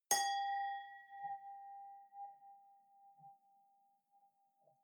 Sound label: wine glass hit ring fluctuate